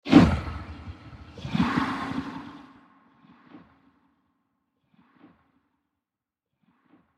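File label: scary monster sound defense mode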